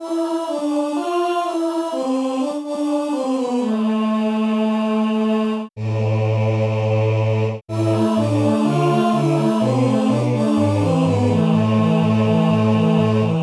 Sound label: echo drops